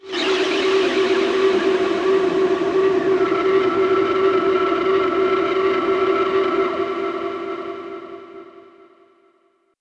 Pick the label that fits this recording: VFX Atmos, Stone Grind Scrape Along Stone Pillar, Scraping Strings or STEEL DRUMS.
VFX Atmos